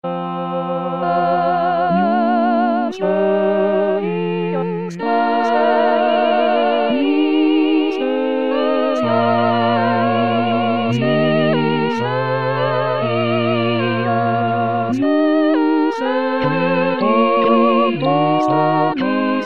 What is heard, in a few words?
Agnus Dei Tutti; La Messe O magnum Mysteriumde Tomas Luis de Victoria